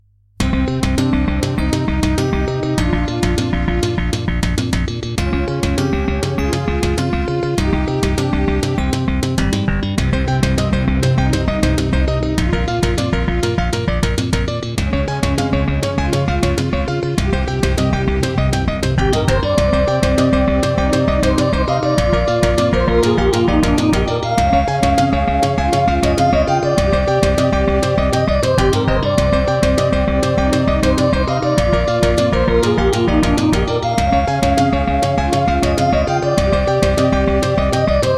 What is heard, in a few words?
Yamaha DB50XG GS MODE; Introduction